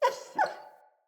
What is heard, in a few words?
Wolf Cub Trapped Call Close; Animals; Wolf Cubs; Orengal Wolf Cub A